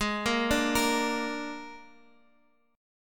Gsharp Suspended 2nd Flat 5th, Guitar, Half Step Down